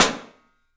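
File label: PICOLO SNRS, snare, drums; 3D Sound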